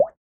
Drop, notifications

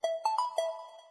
Robot, notifications; Meitu; V7

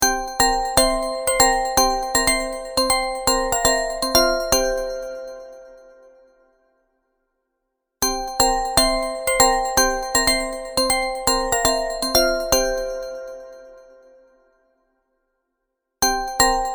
Clean Bell, ringtones; Itel; itelOS 13

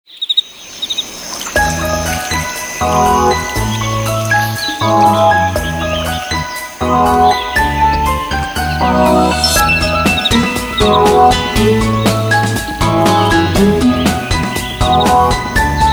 Drifting downstream, ringtones; Samsung; Galaxy Trend GT-S7560M

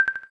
Xylophone

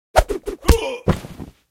music fight, alarms; Samsung Galaxy-S-GT-i9008-ophone-CMCC

